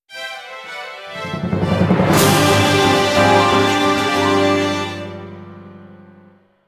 (Jingle) Minigame Lost; Sgt. Byrd

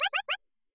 Surprise

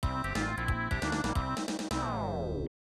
Unused Cinematic Fanfare

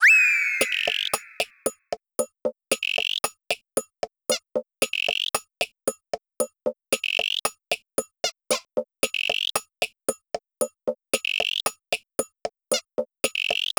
Clock - Alarm; Animal Crossing Clock - Alarm 1; Nintendo DSi

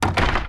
Chest closed; Blockman Go; Android

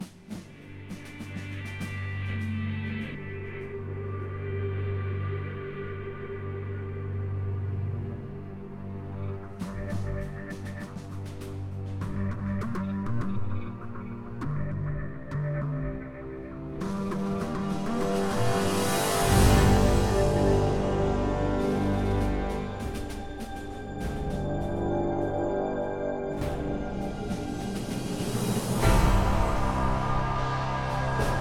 Main Theme Alt - Ambient; Warface

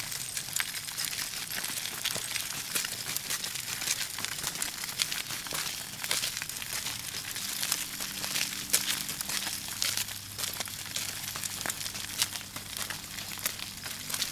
wheels on gravel looping; a dump in the dark

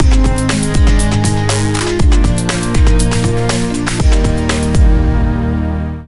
Music Dance Ending; Littlest Pet Shop 3 Blue Team Pink Team Purple Team; NDS

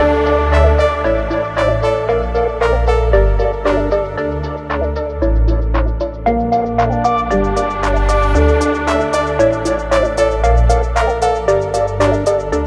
Menu loop

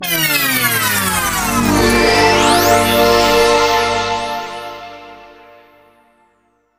Jingle Gameover; Kurulin Fusion; PSP

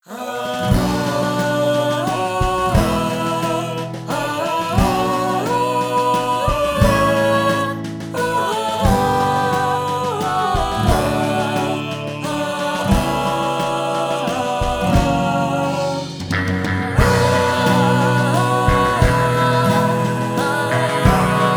Victory Song; Metal Gear Solid 4 Guns of the Patriots

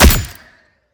Sciweap Shot Small Gun P70 Sidearm

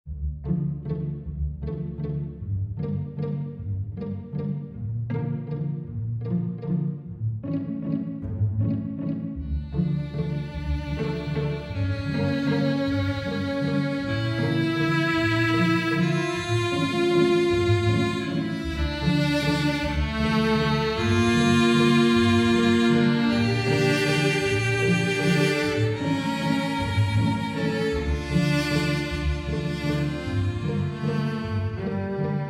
Emotional; Avocuddle

